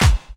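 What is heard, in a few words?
snare drum bass drum, Dance Mega Drums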